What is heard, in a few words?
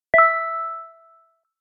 text receive; Sound design for the opera composer: concepts and methods